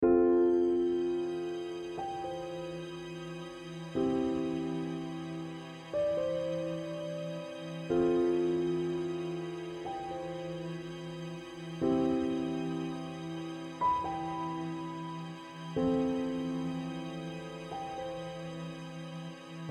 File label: piano strings